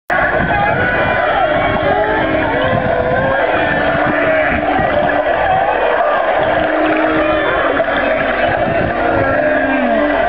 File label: humpback whale song; banks